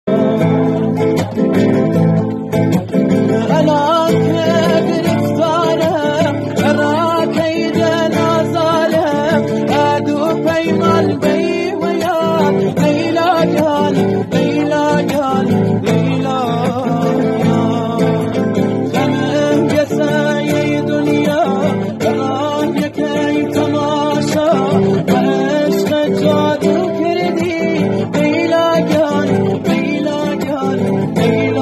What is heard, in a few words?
kordi ba gitar; kordi ghmgin